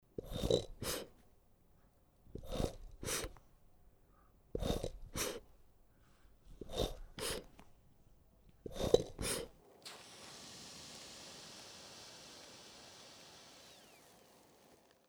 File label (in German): Blutdruckmessgeraet Pumpen Luft raus langsam